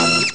Holy synth, Amiga Soundtracker; ST-XX Amiga Soundtracker Sample Packs

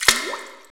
WATER, Gated FX; Optical Media International; Sonic Images Library; SI FX Vol 4

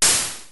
DF-500 HiHat, Drumfire

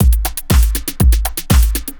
120 HOUSE, DRUMS

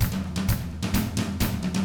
127 Drums

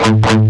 GUITAR, POWER GT

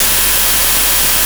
DIGIANOISE; AKAI S-Series CD-ROM Sound Library VOL-5

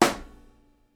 SNARE, Live Snares

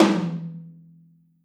Drums Percussion, Kit1 Room Mic

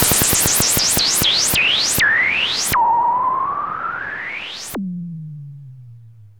NOISE FALL; Zero-G Ambient 1; NOISEFALLS

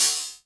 hi hat open, Korg ER-1; 300 Drum Machines; Kit 12

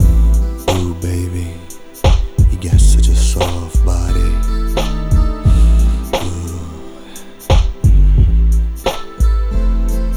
MIX, Hip Hop and R&B; Best Service ProSamples vol.02; AKAI

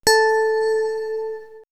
Swirls Bell, pads; X-Static Goldmine

